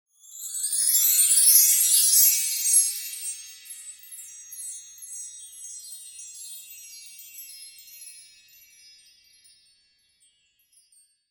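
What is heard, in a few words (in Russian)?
звук волшебства с длинным звучанием переливов колокольчика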